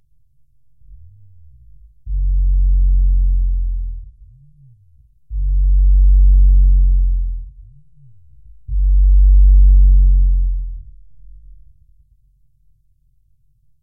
redbrown chirp 350hz

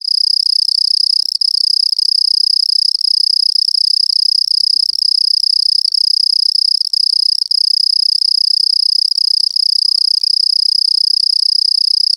eurepa long tailed cricket

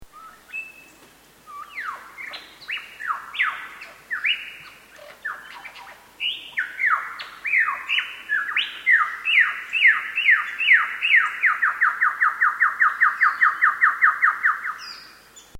winding pinch creek

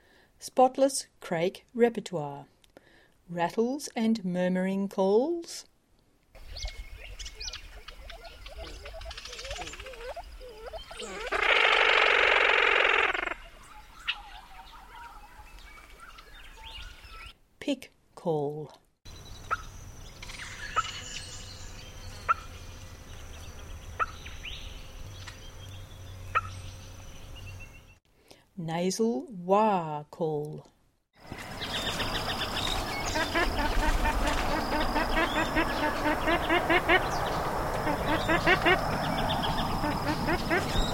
BIRD spotless crake vocals

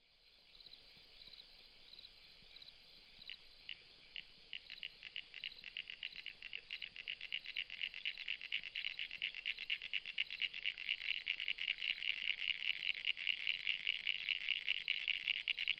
ORNATE BURROWING FROG

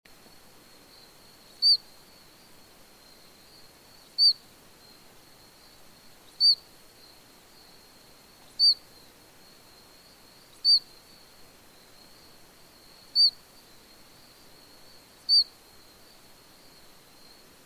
PEEPING CRICKET; ROCKLANDS

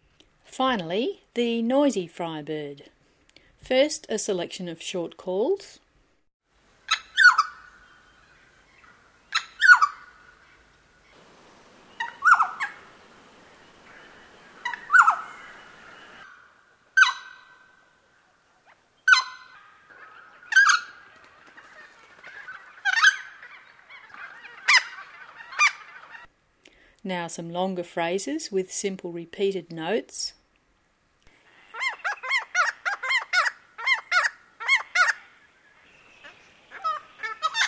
friarbirds noisy

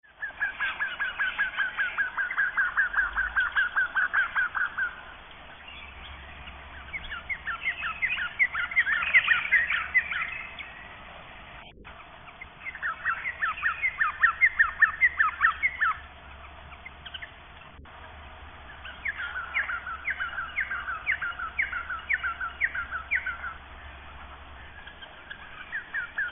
BIRD fuscous

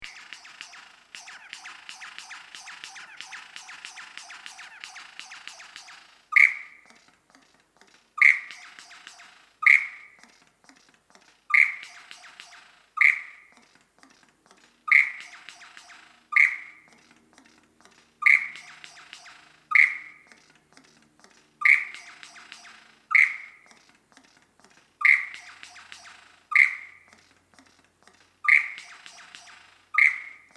twangs blicks hoofs; baalbone

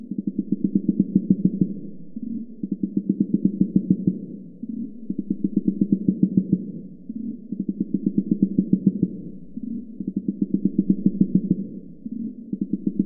INS upolu

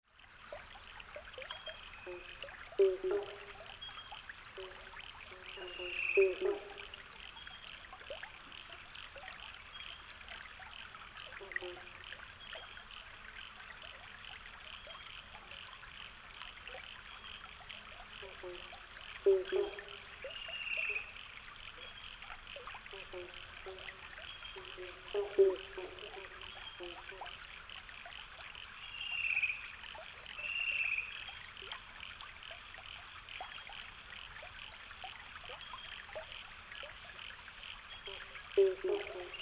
FROGS FAN CUCKOOS

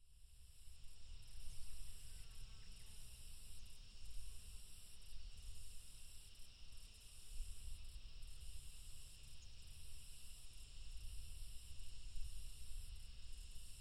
AMB THUNDER

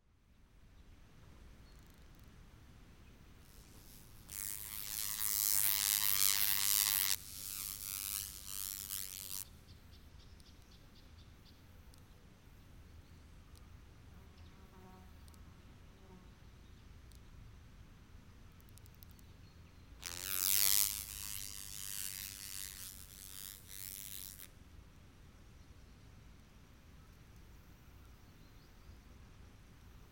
froggatts buzzer

